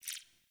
UI Default Theme Tooltip Section

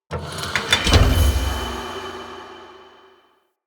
Chest Ode Rare Open

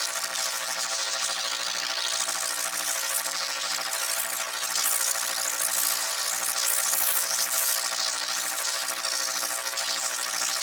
Relay Forcefield Loop; Warframe; Ambience; Hub; Tenno Junction Relay

